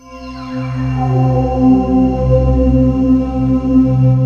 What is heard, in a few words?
SWEEPSTER, D - 70; Rubber Chicken Software Sample Bank